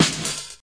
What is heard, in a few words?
clicky acoustic snare sound e key; snare drum samples